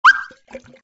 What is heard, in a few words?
watercooler appear only